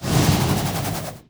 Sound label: Cyclone Slash Long